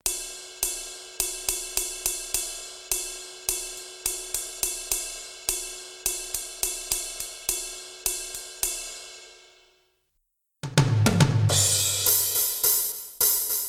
percussion; brick and mortar; one living church

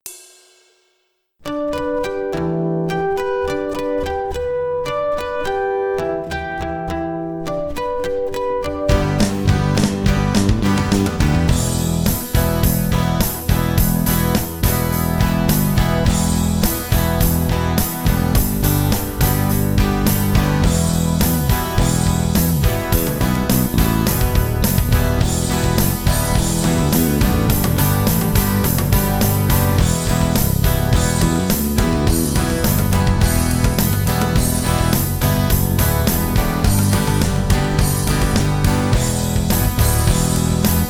melody; onelivingchurch

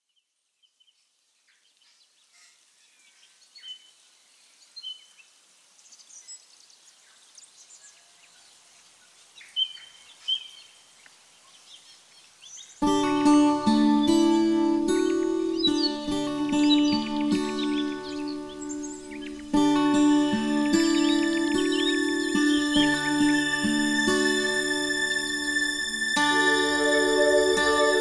ambiance melody; smorgasbord

